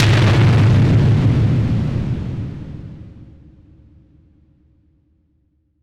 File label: Explosion, dubstep